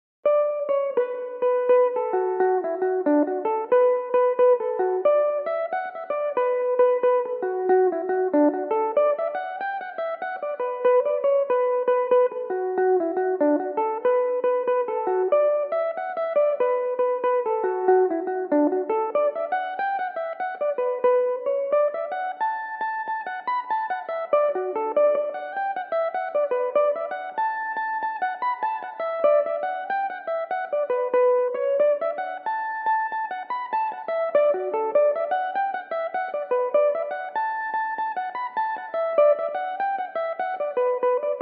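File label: B minor, irish mandolin, tunes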